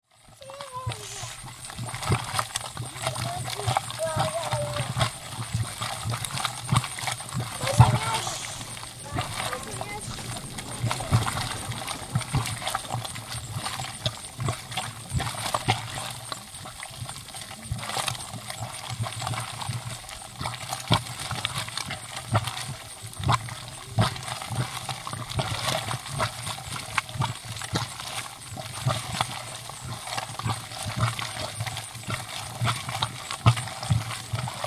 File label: thumb geyser basin